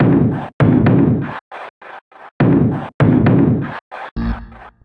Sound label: knock knock